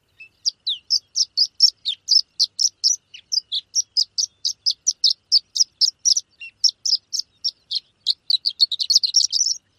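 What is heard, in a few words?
long song, bird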